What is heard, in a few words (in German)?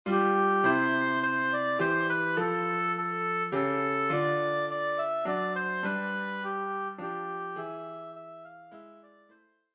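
We wish you a Merry Christmas Oboe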